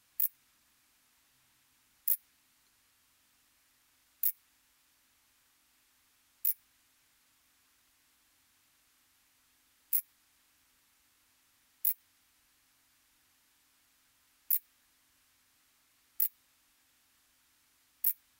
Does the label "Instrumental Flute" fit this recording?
No